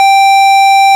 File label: ORG VIP Cl 8'G 7, Farfisa Clar; Farfisa Clar DBar